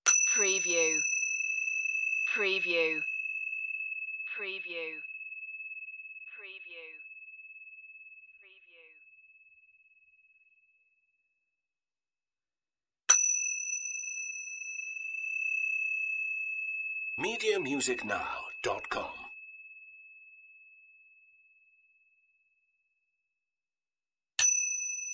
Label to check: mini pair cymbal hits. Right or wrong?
Right